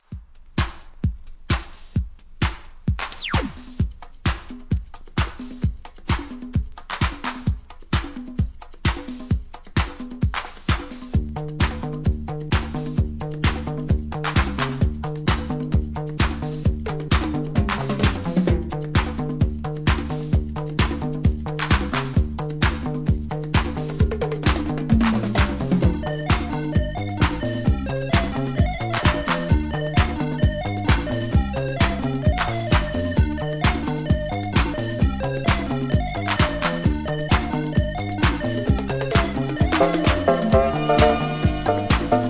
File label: Instrumental